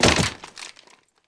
impact gun light

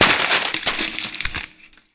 paper bullet impact; Rust

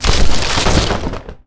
linen; World of Tanks Blitz

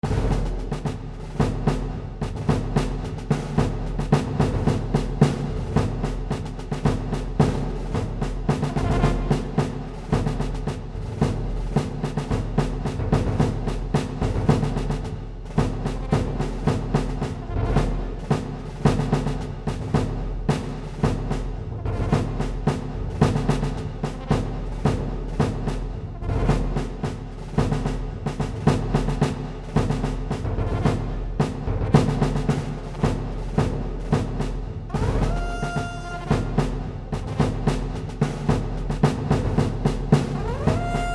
War Drums Late; Civ4; Diplomacy

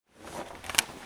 Inf Weapons Foley; Red Orchestra Ostfront 41-45

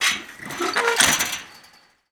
Amb Constructions

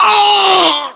death, english

